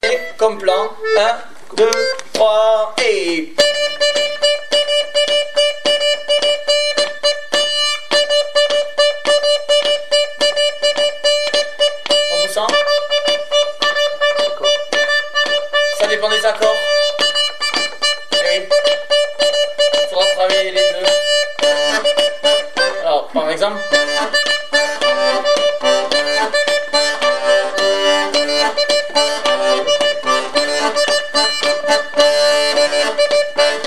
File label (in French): plan de Ré pour diatos; Avant de s-en aller